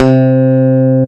Mid Tone, baesse

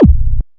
disto bd; percussion

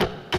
Gate Rim & CHH

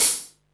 hat; percussion; Single Drums

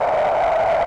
Storm

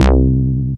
Dimension, baesse

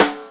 snare, percussion; Single Drums

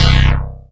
Cut, baesse

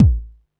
kick

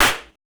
Biting Clap, percussion

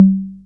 cong tom; percussion; CONGAs-TOMs; SingleDrums

